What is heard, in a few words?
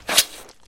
Cleric Scimitar Hit; SyrinScape; Missing Magistrate